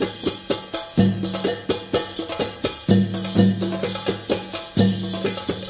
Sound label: triplet middle east, 084 bpm, drum loop